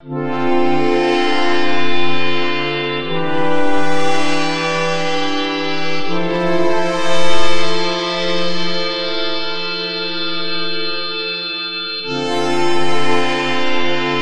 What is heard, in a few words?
pad magnetosphere